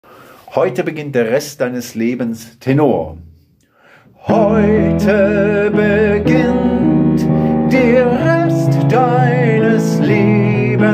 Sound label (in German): Tenor